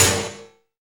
snare FX; Roland L-CDX-01; Snares 6; Sn Modules 6

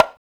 TONG, African Perc; Spectrasonics; Supreme Beats; African Contemporary; Afro DRMs

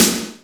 DR550; Best Service Gigapack 2

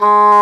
WND English Horn; Roland LCDP04 Orchestral Winds

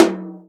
tom, Real Drum Kit, jungle kit

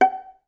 VAS PIZ F#4 L, Orchestra; Best Service; Peter Siedlaczek's Advanced Orchestra

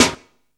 gut, snares; Zero-G - Funky Elements

